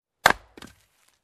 Single Wood Chop

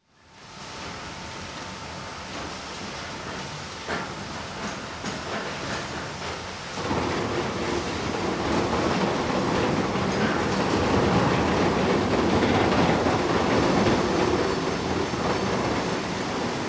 Machinery in Sawmill

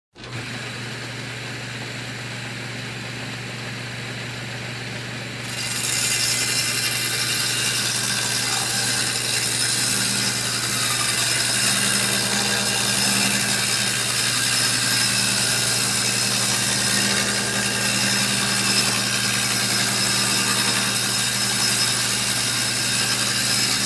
Cutting Metal Sequence

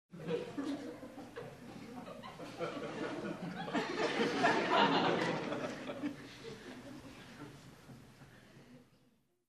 Crowd Minimal Laugh

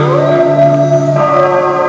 Wolf howl tolling bells

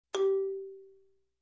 Marimba G Note

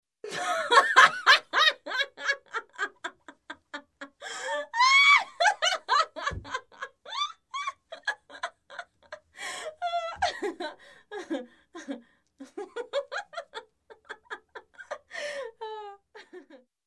Woman Hilariously Laughing

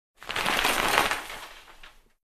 Store Metal gate close

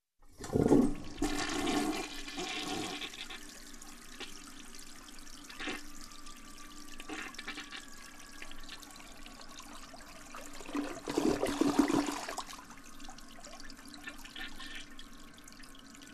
Clogged toilet flushing

